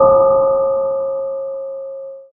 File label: hitting the metal bell pole